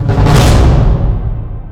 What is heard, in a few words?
deathcam gg, music; Counter-Strike Global Offensive